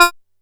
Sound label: F5, Bass; E-MU Producer Series Vol. 6 – Analogia Project; Boom da Bass